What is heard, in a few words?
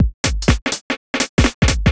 HOUSE BEATS 125